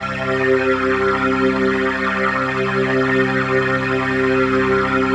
PHASE PAD, ATMO PADS; Best Service; XX Large

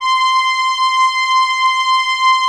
Atmo Pads; Best Service; XX Large

